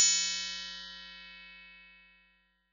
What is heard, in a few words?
synth